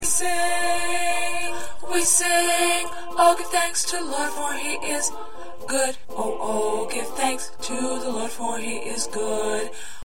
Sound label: 1st Tenor